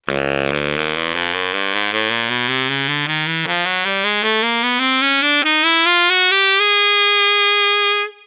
b sax

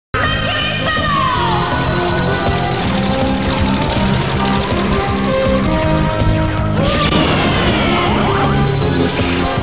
Bubbles

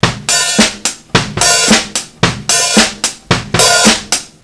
funk

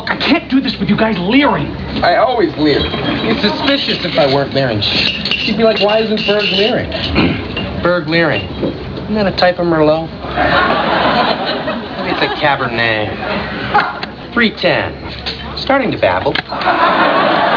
leering

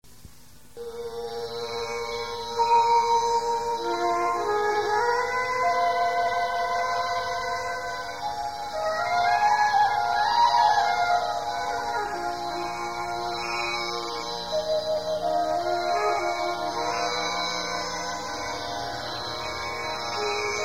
gayatri

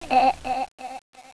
giggle; 4 years old

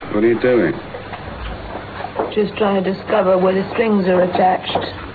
strings

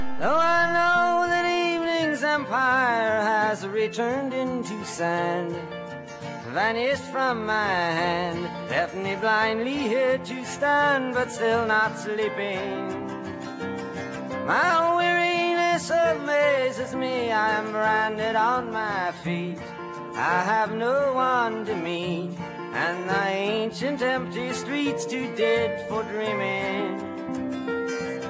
tambourine

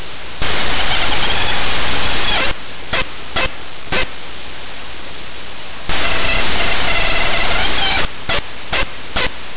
purr yelp, turkey sounds

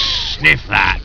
sniff

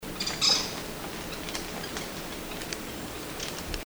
Rufous faced Warbler, birdcall; Abroscopus albogularis; song, call

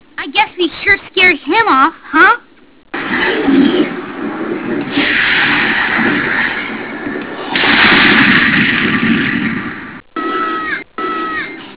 Scared; beyblade; rei

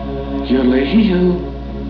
yodel

